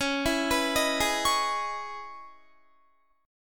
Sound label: Db Minor 9th Guitar; arpeggiated; 21,19,21,20,20,20; 4E,4A,5D,5G,5C,6F